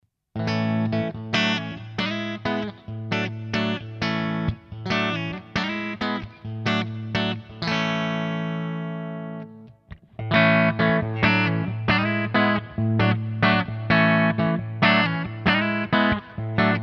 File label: Schecter Bridge PU Split HB clean; Nick Johnston